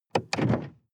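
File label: sports car interior trunk close medium; verkehr transport; autos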